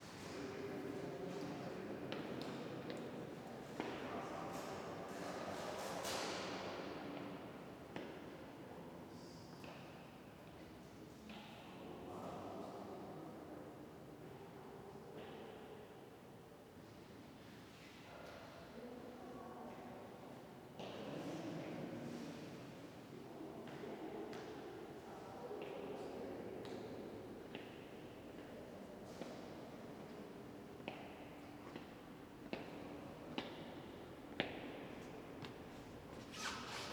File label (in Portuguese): Passos na igreja reverberacao, pessoas longe, batidas